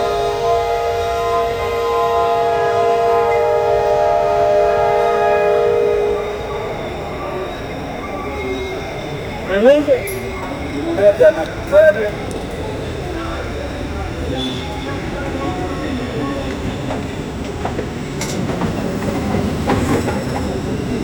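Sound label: authentic indian train station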